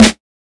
old school wet snare drum sample a key, snare single hit tuned to the A note